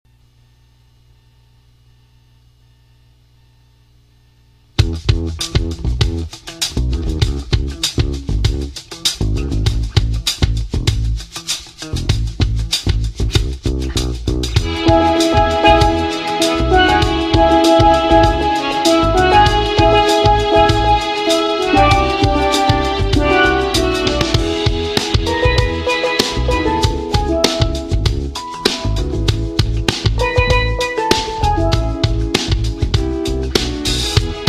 R and B 70s 80s, Steel Drum

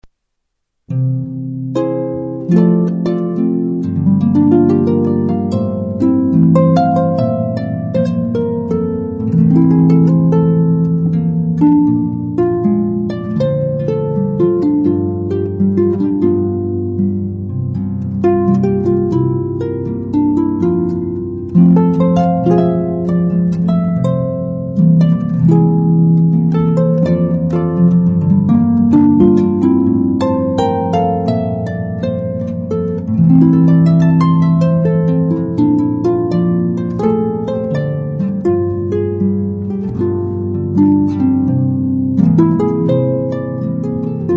Harpist; Classical

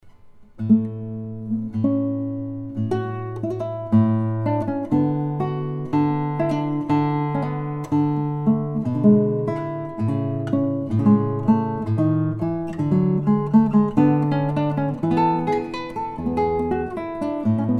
Sonata by D. Scarlatti, classical acoustic guitar

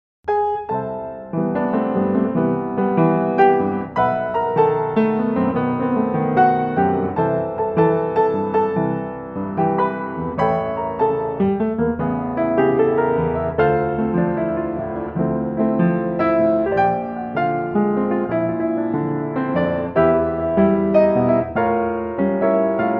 Piano

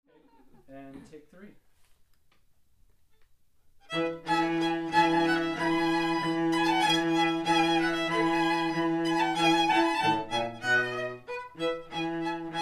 string trio

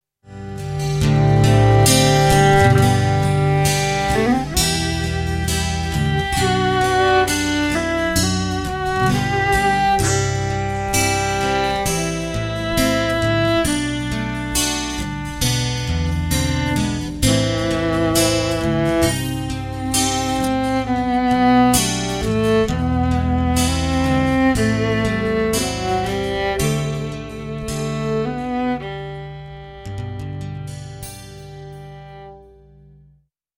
duet, String Quartet

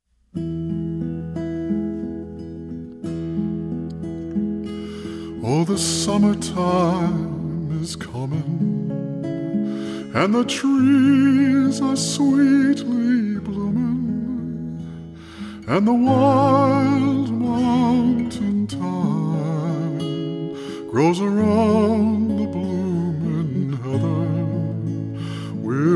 jazz band; Nppersink Rogues

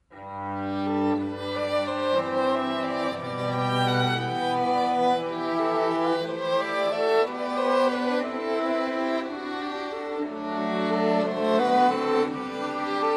String Quartet 2 Trumpets; Jesu Joy of Mans Desiring; Bach; Classical

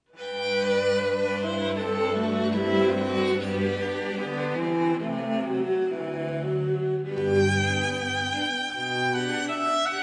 String Quartet

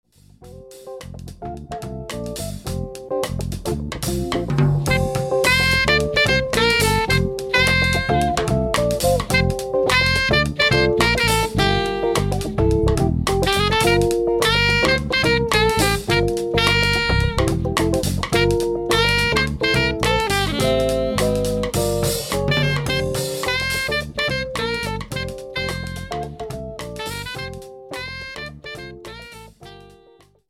jazz pop, Jazz Trio

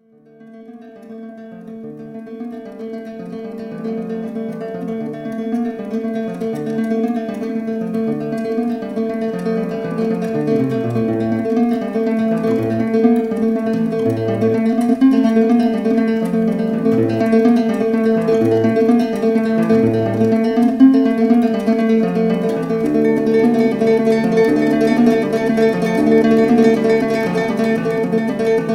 Classical Guitar

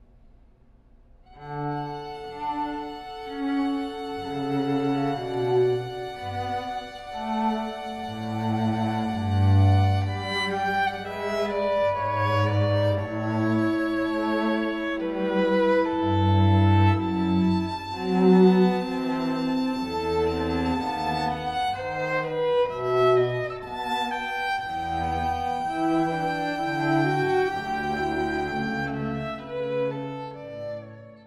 String Trio; Air on G String; Bach

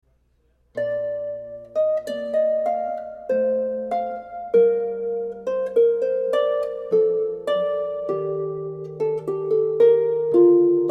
Harp

